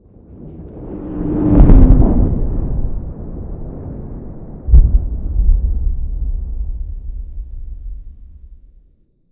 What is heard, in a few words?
distant fighting outdoors